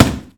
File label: boxing gloves hit; tf2